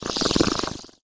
imp chatter, monsters; Doom

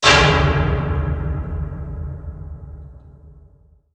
metal hit; DoomRTX; ambient; metals

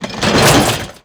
fence land; Quake 4 Alpha; strogg tik

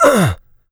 pain light, player; DoomRTX; small

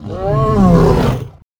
pinky die, monsters vocalizations; DoomRTX

